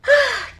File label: "huh", orgasm; Voice; ooh ahh